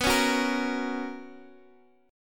B Major 7th Suspended 2nd Guitar Half Step Down, chord